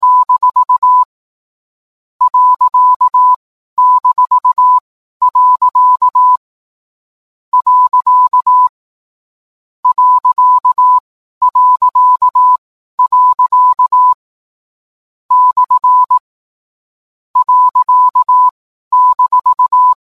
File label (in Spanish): Poema anillo Morse desde espanol